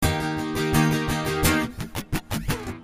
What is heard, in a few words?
Strumming Eb 86